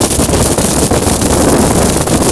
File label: Engine on fire bigtime; 暴力赛车